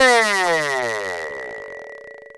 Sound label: Siren Down